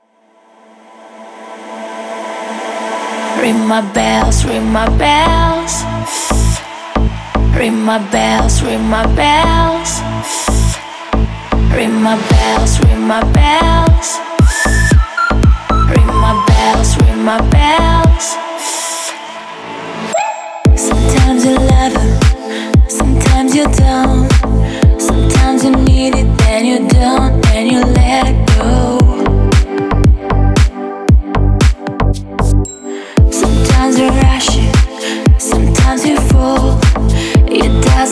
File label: Deep House Remix